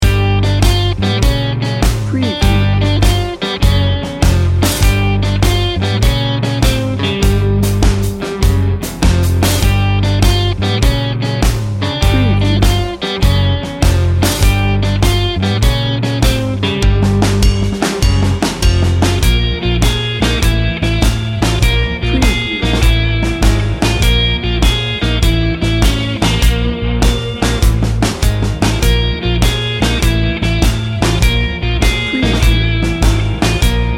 quirky blues rock; Bouncy and playful. Featuring a quirky melodic guitar and a determined bluesy rock groove.

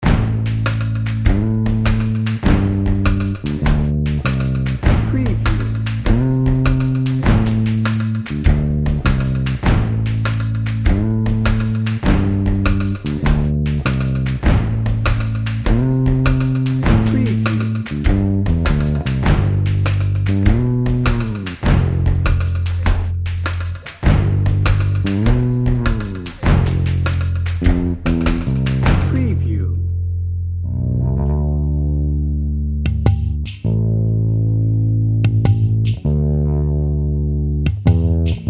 river snake dnb

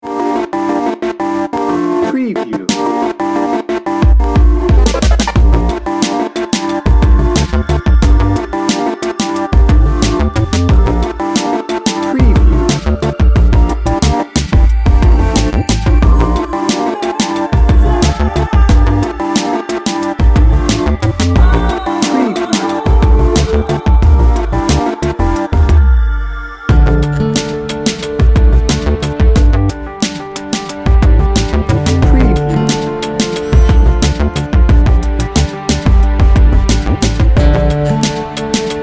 sultry sexy hip hop

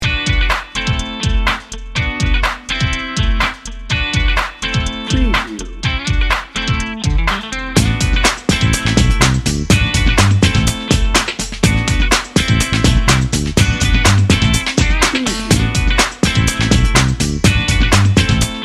funky shoes bed

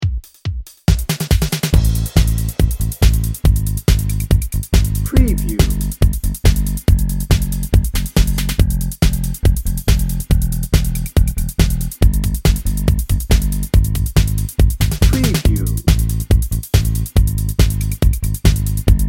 fashion pulse dnb

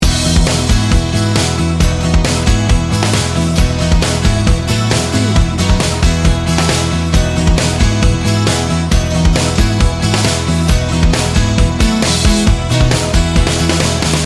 happy optimisim loop

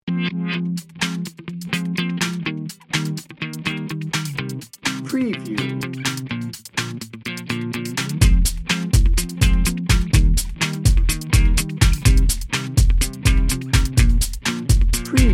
atmospheric ambrosia bed

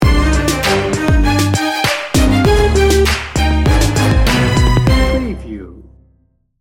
hip hop heartbreak sting bumper

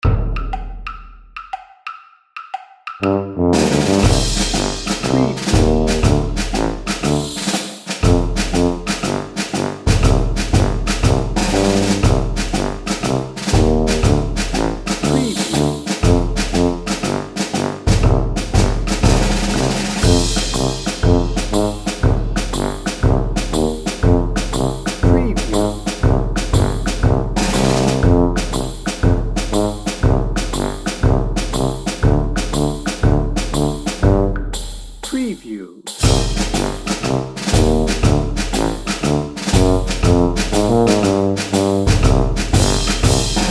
tipsy in tuscany dn tuba

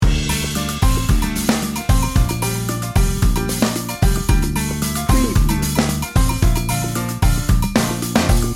salsa montuno loop

